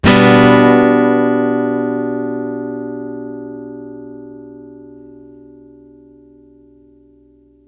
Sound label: One Shot Guitar - 125 BPM (D Major), Acoustic Guitar; Instruments; One Shots; GhostHack; Ghosthack - Free Guitar And Piano Samples